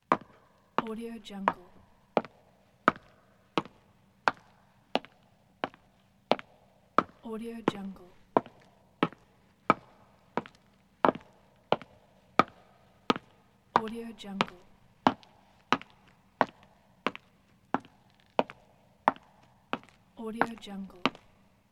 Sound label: walking on floor; winter-soundfx